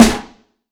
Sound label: soft hitclap